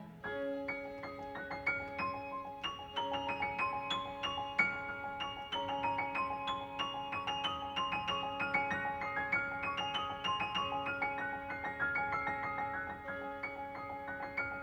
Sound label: Piano Trio in A Minor, Variation